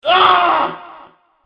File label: scream